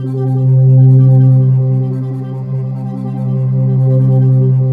PAD, Drum & Bass, 150 BPM; Ueberschall; Drum & Bass Flash Trackz

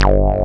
JP6 mouth; E-MU Formula 4000 Series Vol. 3 – Analog Odyssey; VK+ Instruments

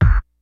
G-FUNK KIT, DRUMS; Kid Nepro; Elite

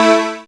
syn a, Dance Synths Stabs & Hooks; Best Service ProSamples vol.37

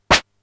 snare high, Polivoks

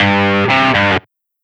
Guitar Lick; Sonic Foundry; Rondo Brothers Trip-Hop Loops & Samples

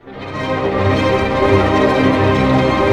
ARP C, STR ARP MIN; Sound On Sound - Peter Siedleczek's Orchestra

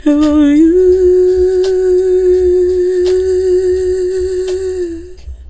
FEMALE, Hip Hop; E-MU Formula 4000 Series Vol. 1; Hip Hop Nation; Phatt Phemmes 3

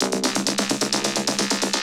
Vocobeat; Sonic Foundry (Sony Creative Software)

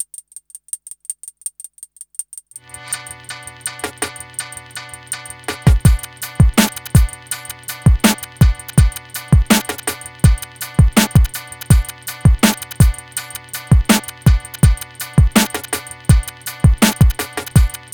MIX, Hip Hop R n B; USB Soundscan vol.19; AKAI

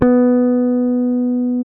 BAS B 3; Best Service Gigapack 2

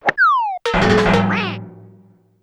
Missed Shot, Yamaha DD-35

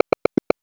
Polyfusion Modular, synths

